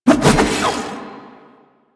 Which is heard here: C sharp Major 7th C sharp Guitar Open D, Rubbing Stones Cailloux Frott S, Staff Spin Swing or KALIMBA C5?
Staff Spin Swing